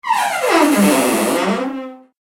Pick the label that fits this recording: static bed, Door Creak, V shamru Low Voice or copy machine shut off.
Door Creak